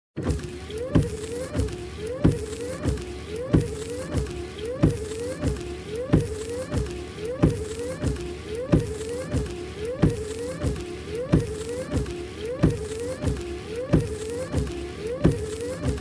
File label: Windshield Wiper